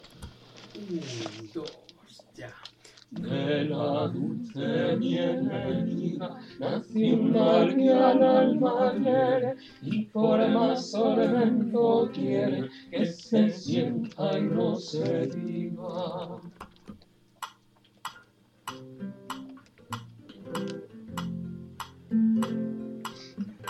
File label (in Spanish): todos completo